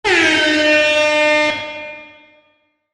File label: single horn